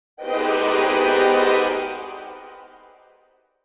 Cartoon horror suspense

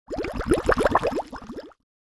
Cartoon bubbles

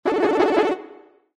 Classic game level up

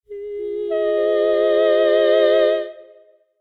Heavenly choir swell

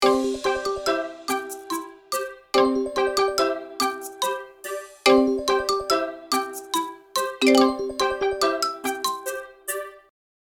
Smartphone ringtone modern call

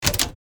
Thin door open

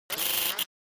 Error noise

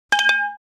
Metal baseball bat dropped

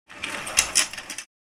Wooden rail curtain rings slide dry squeak; blinds

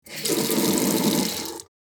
Bathroom sink running water short

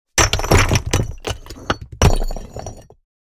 Small bricks thrown on a big pile of rock

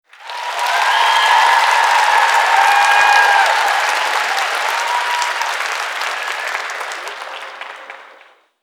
Sitcom audience applause and cheers; crowd

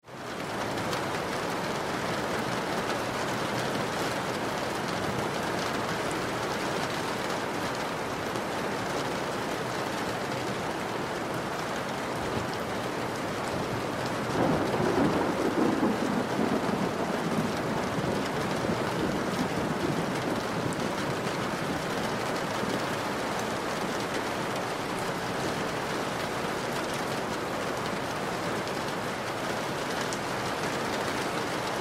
Rain on window sill with distant thunder

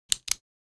Pen office single click

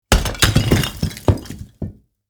Big building block thrown on asphalt

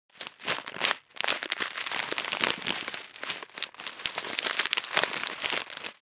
Packet of potato chips opening

